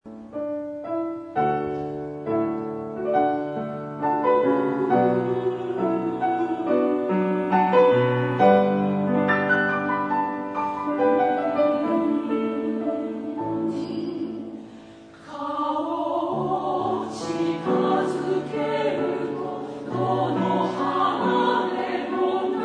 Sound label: female, Pf; Chihara Hideki; Hakkasou; chorus